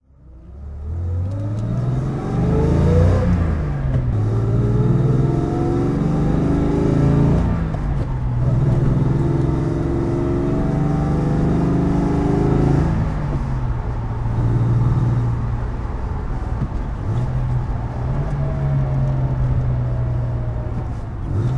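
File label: mercedes benz 300sl gullwing driving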